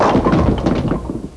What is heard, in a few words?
BOWLING; Sports